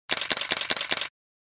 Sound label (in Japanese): kamera